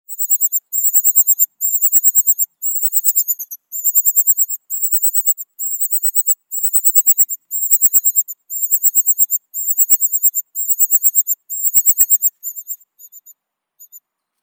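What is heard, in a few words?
common cuckoo, Cuculus canorus, chick; kukushka obiknovennaya; ptenets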